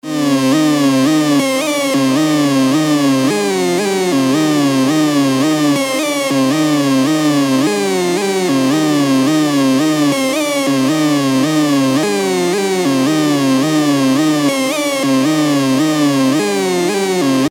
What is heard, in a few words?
loop 110